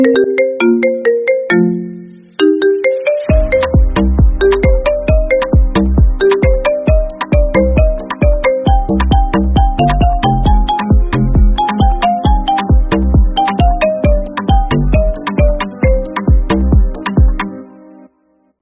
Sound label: iphone ringtone marimba remix